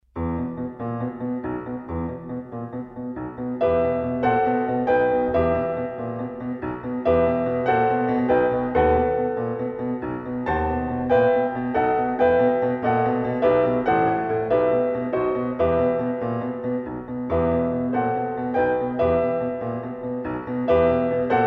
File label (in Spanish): Improvisacion Ritmo asimetrico